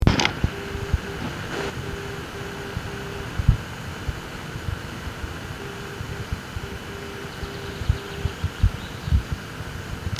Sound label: Unit 385KHZ received in Forest, NDB Beacons; nearby Wloclawek